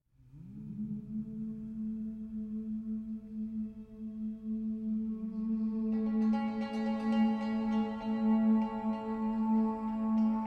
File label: instrumental; Gladiator; آهنگ های فیلم گلادیاتور